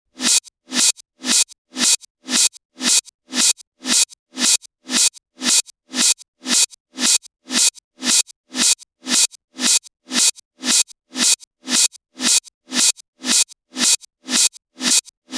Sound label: hats 115, Hat Loops; drum sample pack; SEISMIC; Slate